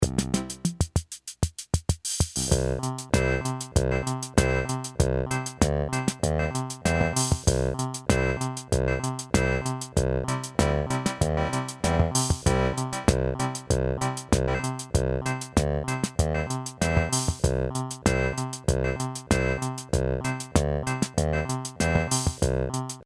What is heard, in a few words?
Casio